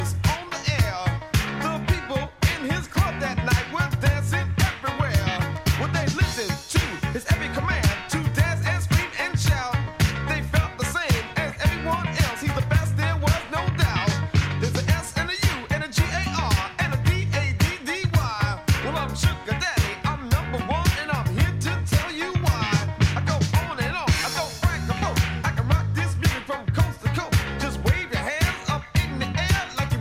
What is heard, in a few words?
boombox